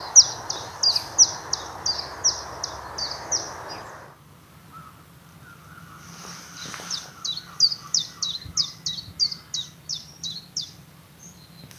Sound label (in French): pouillots